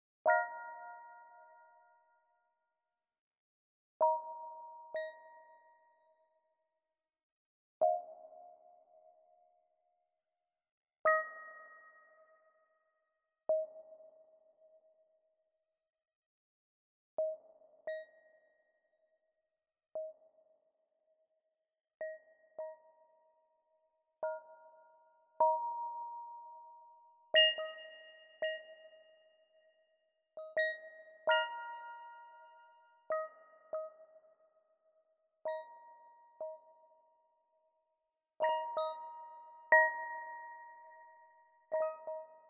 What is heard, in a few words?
rain; csound